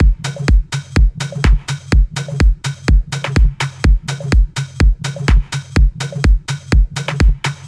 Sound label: Drum Loops 125bpm